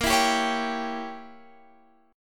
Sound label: A sharp Diminished 7th Guitar Standard, chord; x,13,14,12,14,15; strummed; JGuitar